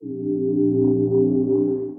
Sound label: Docking Bay Pad; Synth Pad; Ableton; Factory Packs; Synth Essentials